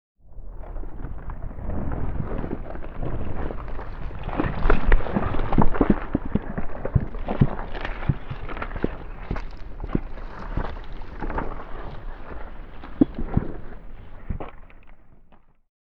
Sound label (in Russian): Zemnaya kora treskaetsya